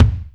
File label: Kick, Alesis DM5